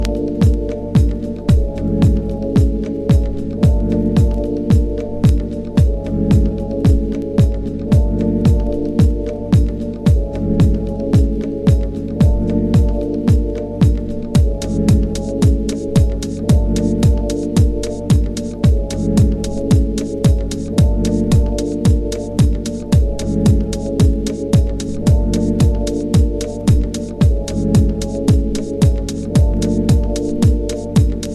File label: DOWNBEAT